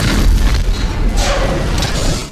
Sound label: ROBOT; Best Service; Advanced Mega Effects